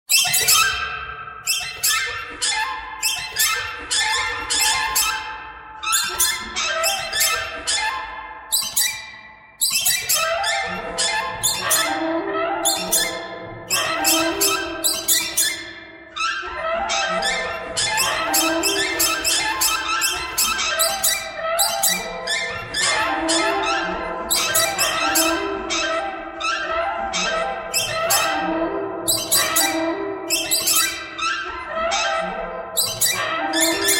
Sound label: birds